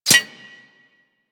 Arrow Impact Metal; Warframe; Weapons

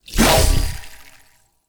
Sword Board Throw, Whooshes; Weapons; Melee; Warframe; Pacifist Sword And Board

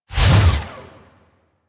Mech Melee Swing; Nechro Mech; Vehicles

